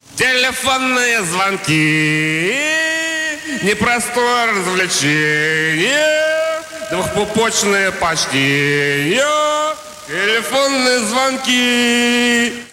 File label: calls